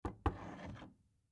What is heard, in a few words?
Cologne Grab, Cologne Grab Bathroom Cupboard; Primping; Human; Household; Blastwave